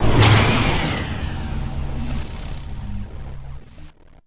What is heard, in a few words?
laser Wall open; doors elevators; Star Wars Obi-Wan; XBOX